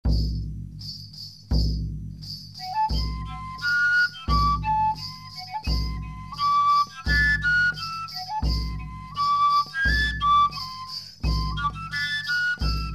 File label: Willow Flute and Drum